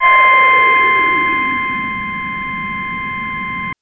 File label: Synth VoiceSweep c5; TrashLibrary